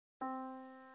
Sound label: yamaha Disk